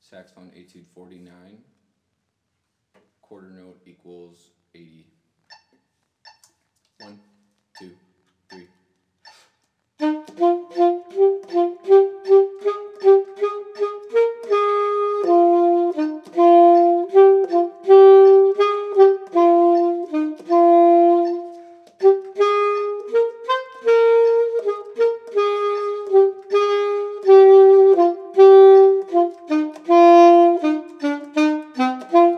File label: alto and bari etude